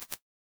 spreader 25 125ms

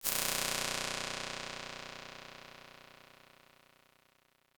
comb saw

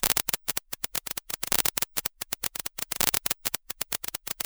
wildecho

